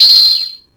glasses; hugh

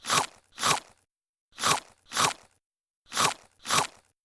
eating lizards

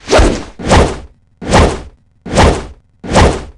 camera swing; dgs2; heita

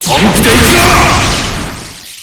overdrive; jubei

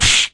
shout; yttd

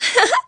chuckle; yukari

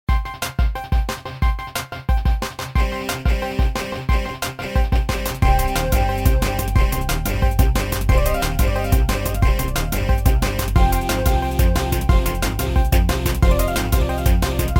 minigame

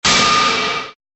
shock

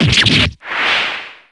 superdamage

